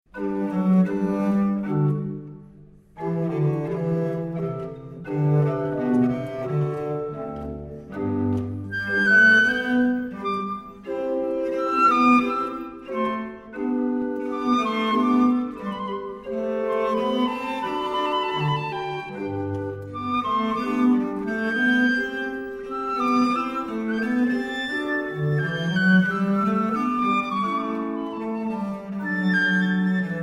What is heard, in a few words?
andante; 3eme sonate